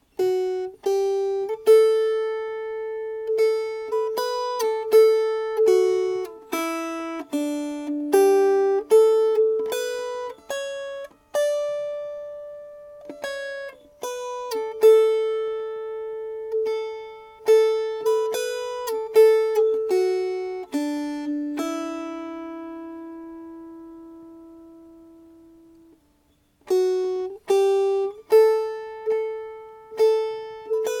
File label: Melody; Darling Nelly Gray